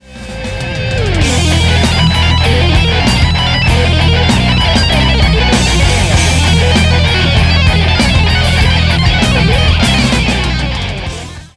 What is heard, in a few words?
Solo